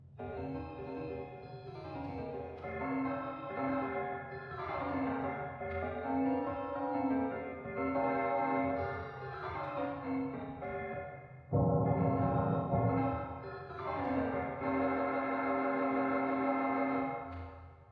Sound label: organ; Cryostasis; Deformed Reality